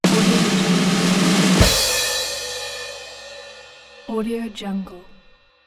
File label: drum roll